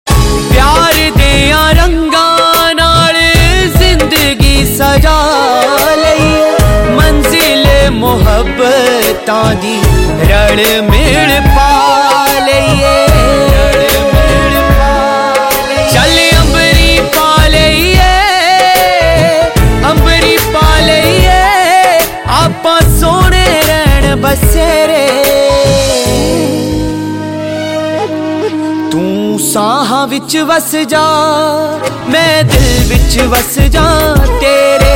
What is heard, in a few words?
Punjabi Sad Tones